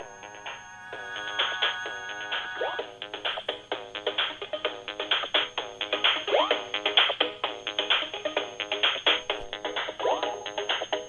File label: holding music; virgin media